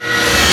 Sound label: REVRS HIT; AKAI S-Series CD-ROM Sound Library VOL-1